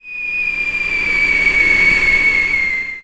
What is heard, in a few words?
artillery incoming; ModernWarfare; Explosions